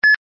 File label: calculator; Picture Keyboard